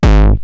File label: Roland TR-909 BD; BD Selection